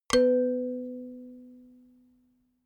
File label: notification kalimba octave low